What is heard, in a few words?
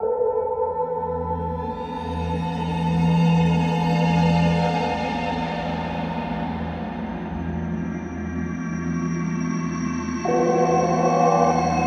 Spaceship 70BPM